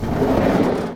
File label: slide door